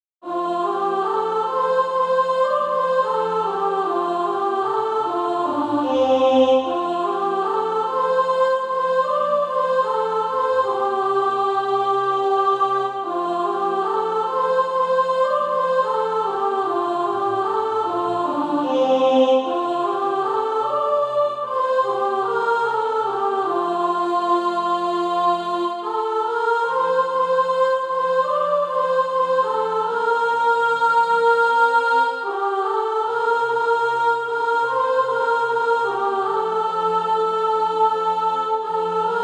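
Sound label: sop, Practise Music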